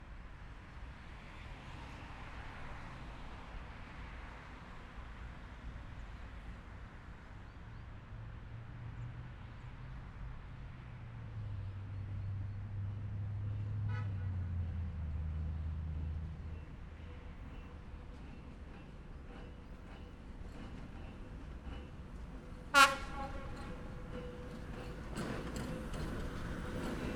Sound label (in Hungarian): beerkezo vonat